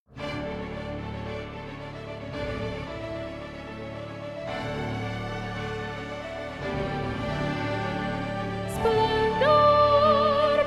Soprano 1

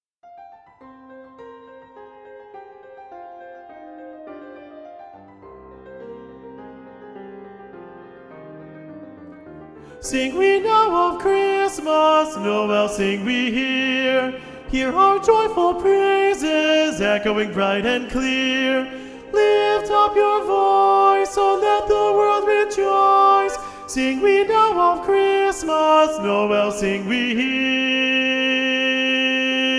Sing We Now of Christmas SSA Alto

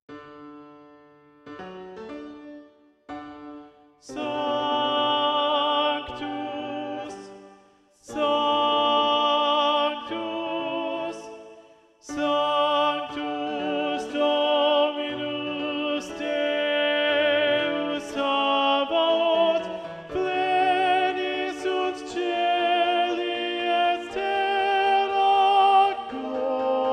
Sanctus, Tenor; Mass No2 in G

